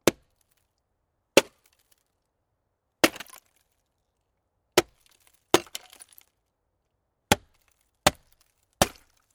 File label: breaking ice